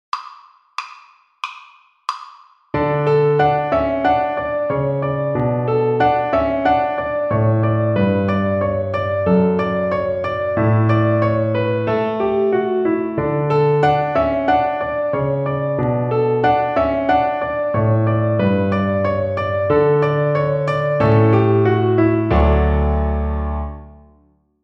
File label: String Gym, Piano Accompaniments; Etude 16 - Octave Jumps on D